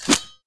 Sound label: throw, grenade